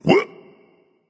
surprised, voice; Smite; ymir skin14

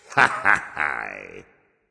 heh heh; smite; Vulcan

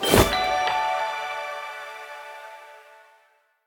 melody; smite

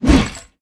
auto swing shield; smite; Ares

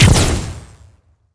knockback; smite; olorun

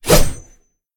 Swing; smite; god susano

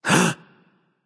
nv breath, vox; smite; voicepack; vulcan

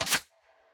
minion bow shoot; smite; npc; roman archer

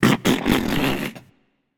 nv tongue; smite; voicepack; hachiman

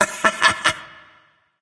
hehheh; smite; hunbatz

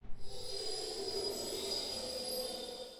nightmare glide chimes; Smite; Thanatos

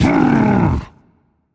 tongue rage; chaac

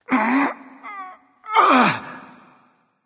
gruntlift; smite

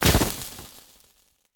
fire impact; smite; poseidon

